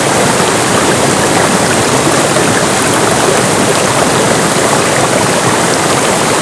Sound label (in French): cascade